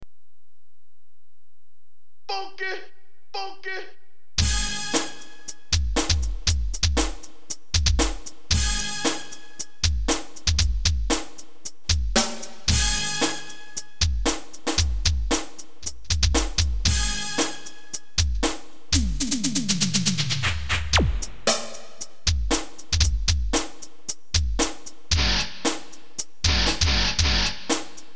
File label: Drums